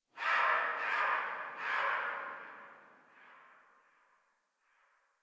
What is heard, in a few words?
Tree Distant Critters Scared, Ambience